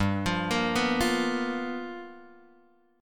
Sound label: G 7th Sharp 9th G chord; G7#9 Chord {3 X 3 3 0 1} arpeggiated